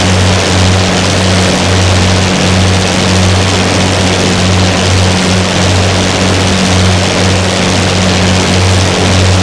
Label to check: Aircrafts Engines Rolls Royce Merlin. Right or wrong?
Right